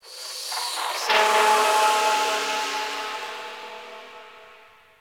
Venus Landscape Construction Site One Off, Ambience; Warframe; Venus Open World